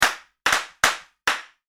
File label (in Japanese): クラッカー